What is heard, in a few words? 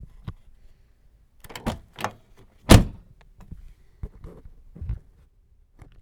car door